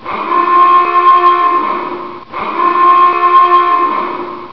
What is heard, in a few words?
dive alarm; Charleston